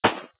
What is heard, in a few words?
splat soft